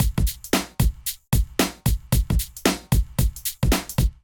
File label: Kit groove 113 bpm; MIDI Clips; Emperor Kit groove; Ableton; Factory Packs; Drum Essentials